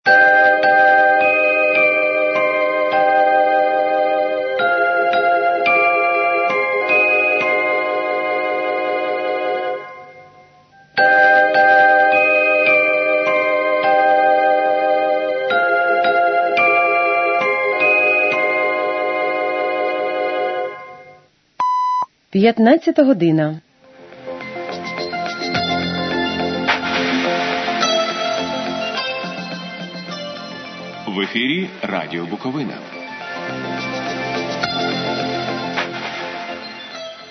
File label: interval signal; ur chernivtsi bukovina